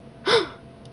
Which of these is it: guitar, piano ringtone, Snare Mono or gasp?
gasp